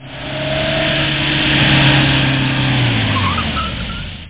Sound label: car